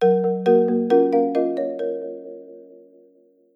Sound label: alert; zombiesurvival